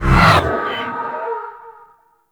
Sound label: Wraith Teleport; Enemies; Warframe; Zariman